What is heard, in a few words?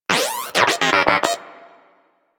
Moa Inquisitive Vocal Agree; Warframe